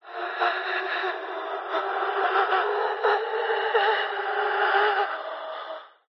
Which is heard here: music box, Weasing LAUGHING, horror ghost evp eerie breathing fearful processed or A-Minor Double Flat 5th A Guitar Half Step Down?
horror ghost evp eerie breathing fearful processed